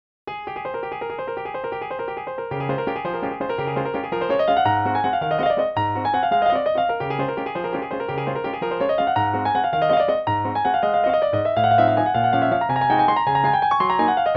kawai ca501 ex concert grand standard

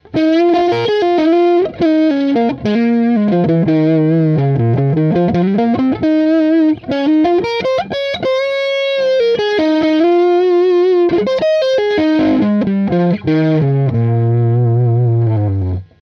harley benton te-90flt neck gain lick

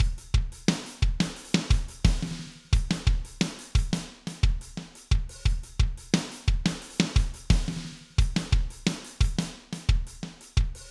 drums dry; spl vitalizer

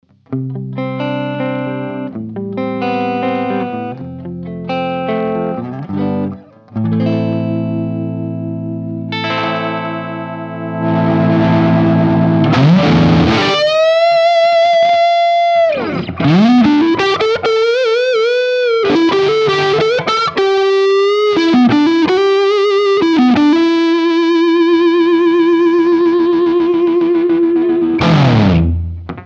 gibson les paul lpm neck clean to gain

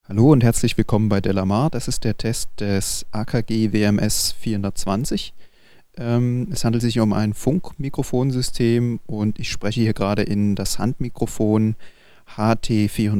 akg wms420 vocal set d5

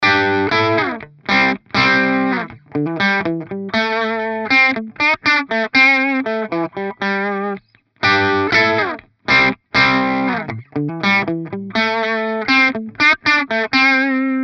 reamp reload loadbox output clean amp clean; two notes torpedo reload

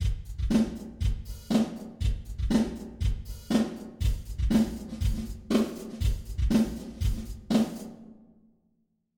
eventide 2016 stereo room drums wet

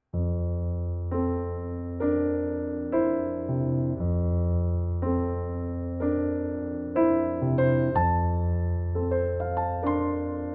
royal grand 3d; nord piano 4

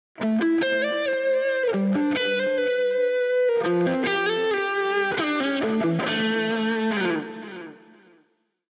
harley benton fusion 3 hh roasted ssp; Harley Benton Fusion-III HH Roasted SSP

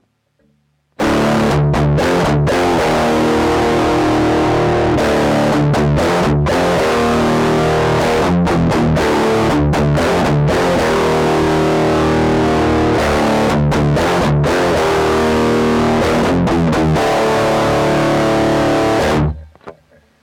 blackstar; subdecay

